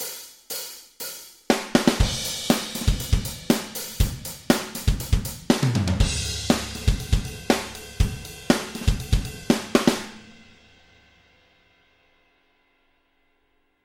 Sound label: EzDrummer